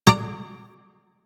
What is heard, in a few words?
Shawzin Wrong Note D; Warframe